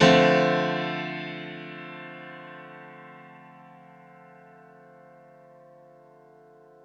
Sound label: One Shot Piano 140 BPM (Dmadd9), Grand Piano; Instruments; One Shots; Ghosthack; Guitar And Piano Samples